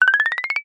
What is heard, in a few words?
cartoon climb ascend ladder short high pitched lite